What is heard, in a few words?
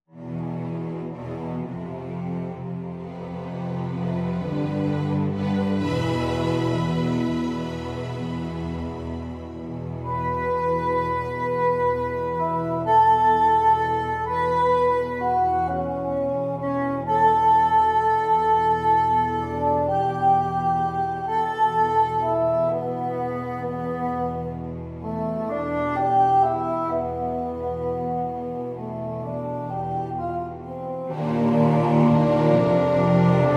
Bassoon Concertino